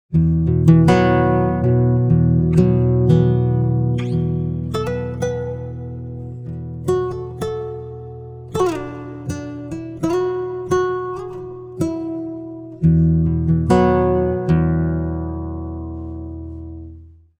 guitar, pitchshift -5; prosoniq time factory clear scale

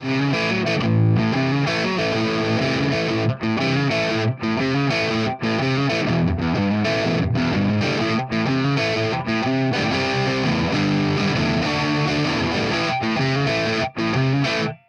jag hh sat; fender classic player

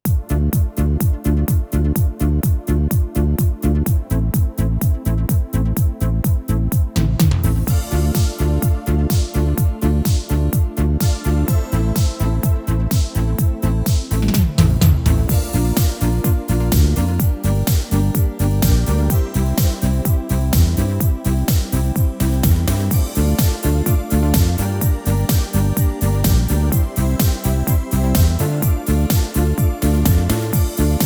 style entertainer discofox; yamaha tyros3

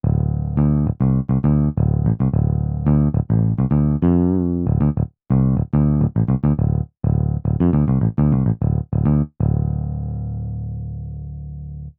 ebass amp sample; Clavia Nord Wave

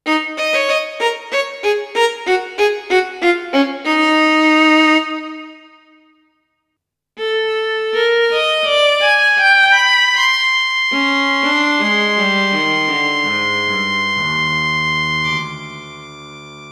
strings solo; kurzweil pc361

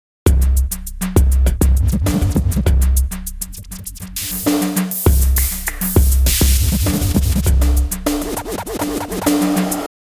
scratch loop; sugar bytes; effectrix

